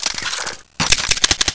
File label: desert eagle reload